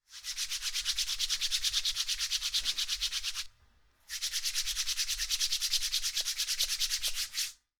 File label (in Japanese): 手こすり; 音フェチさんのための効果音抜粋 vol.1